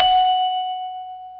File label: Bell F#5